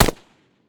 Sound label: m4 dist, weapons